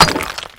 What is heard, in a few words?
Stone Damage; fowl